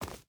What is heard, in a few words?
footstep hide forest run; Rust